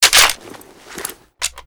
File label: reload clip out, foley; mp5